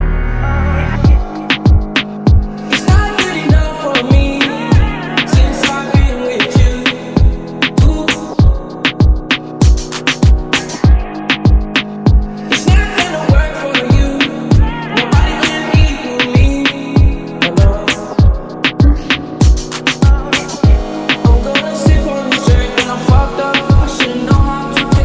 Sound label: french ringtone